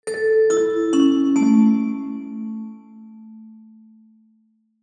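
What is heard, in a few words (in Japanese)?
お知らせ・終わり・リバーブ有り; 戏剧音效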